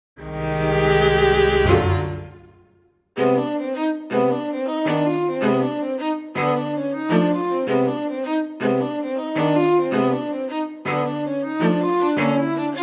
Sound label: String Quartet